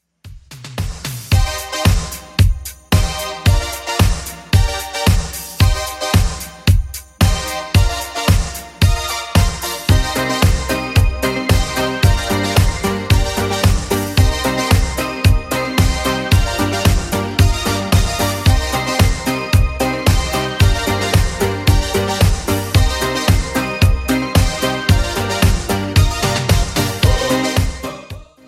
80s Redrum 112bpm

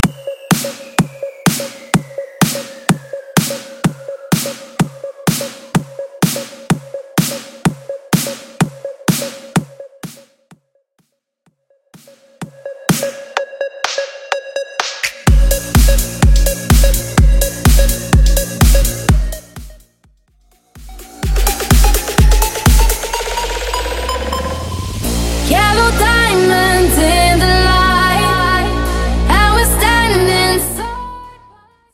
Bass House

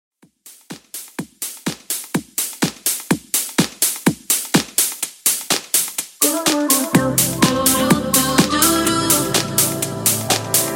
Extended Mix 125 Bpm Clean

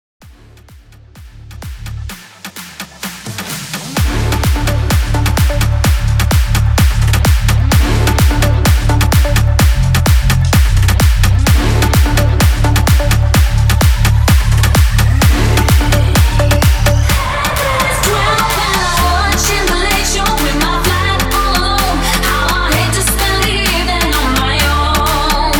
big room, 128 BPM

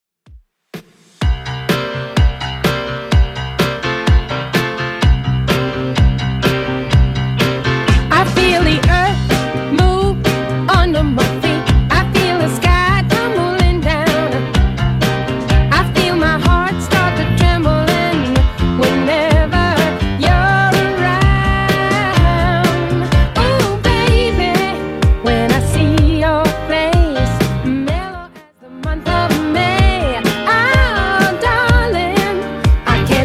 60s Redrum 126bpm CLEAN

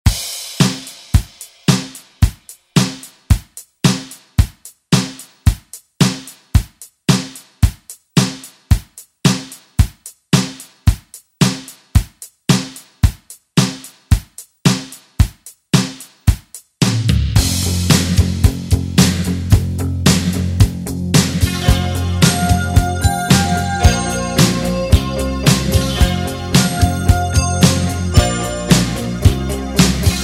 80s ReDrum; DJ Mhark

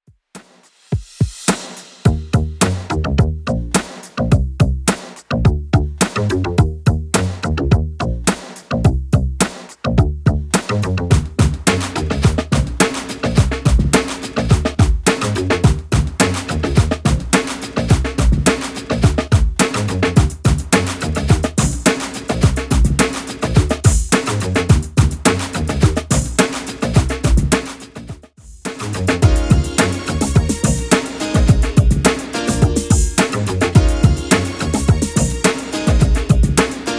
90s Redrum 106bpm

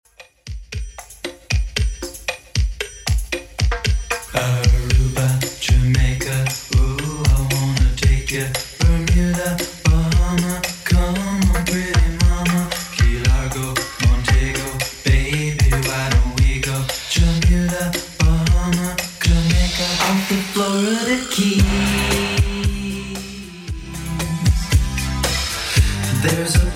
80s 115 bpm; Redrum

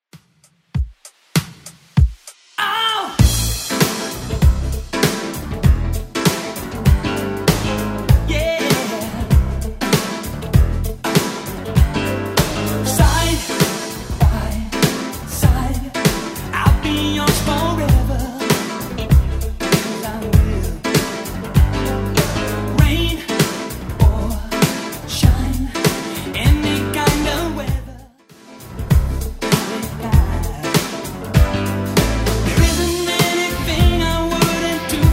80s Redrum 98 bpm

